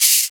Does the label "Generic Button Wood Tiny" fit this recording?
No